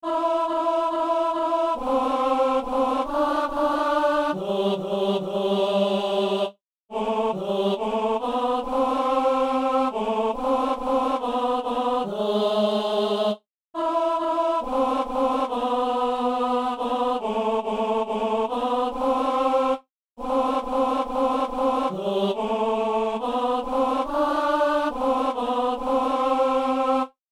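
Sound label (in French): ténor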